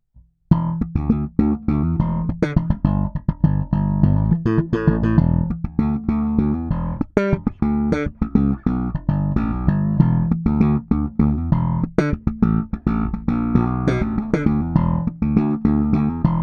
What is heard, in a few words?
Harley Benton BZ 5000 Both PU passive Slap